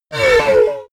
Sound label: sci-fi laser shot long